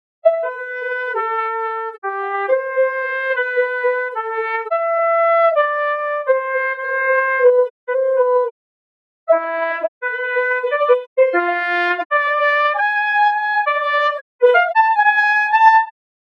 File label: solo